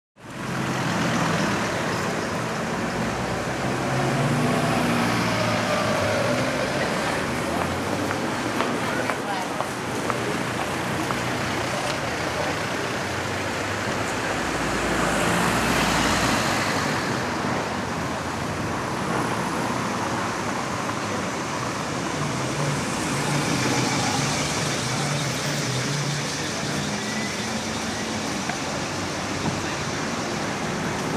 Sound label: town traffic